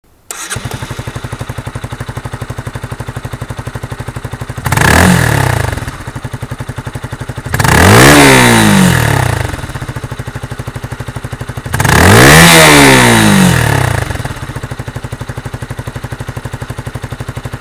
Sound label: exhaust